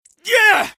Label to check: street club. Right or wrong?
Wrong